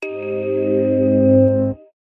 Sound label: motorolla droid awake ringtone, sms ringtones